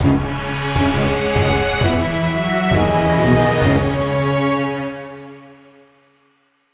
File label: war defeat; Castle Storm